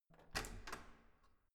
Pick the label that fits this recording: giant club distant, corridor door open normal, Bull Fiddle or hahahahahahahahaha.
corridor door open normal